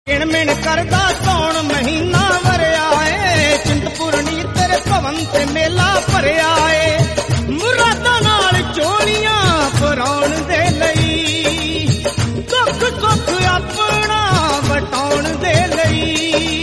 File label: hindi devotional bhajan; jai maa chintpurni